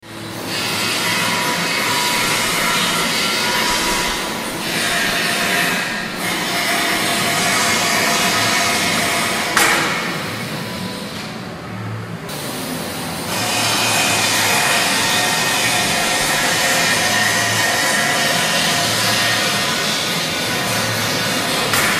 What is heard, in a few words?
Factory Sounds